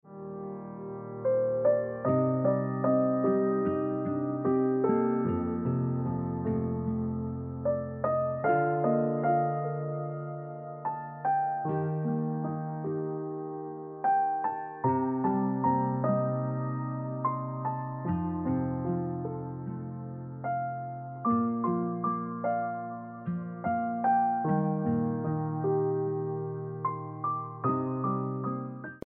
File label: Ringtone Piano Music